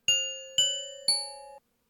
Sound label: Gliding buzzard